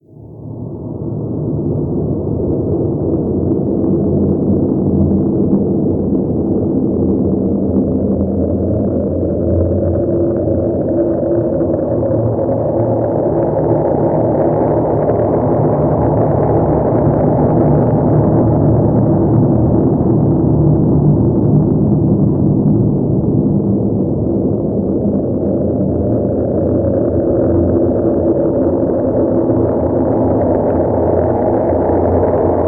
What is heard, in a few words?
Mood Halls; Vectron; Steamworld Dig 2